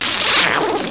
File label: slime pain; svencoop; sc psyko